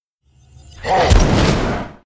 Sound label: biggolem boss; thedead3